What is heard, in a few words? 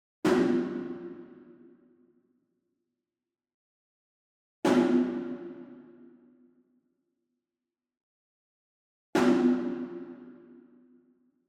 ENSEMBLE DRUM Shime Daiko And Chinese Tom Hit Far; boom library; Cinematic Strikes Construction Kit